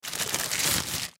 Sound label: Bag Plastic Open; Rustling; Foley